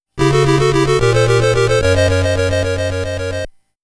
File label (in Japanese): ファンファーレ; 石像が妖精に戻る